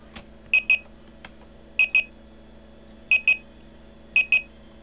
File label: beep